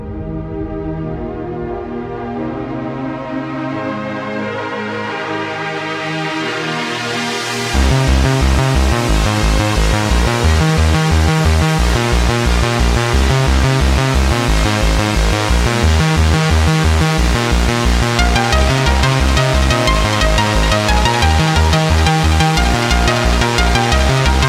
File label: Bass